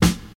high quality dry snare sample f key, snare single hit tuned to the F; snare-drum-samples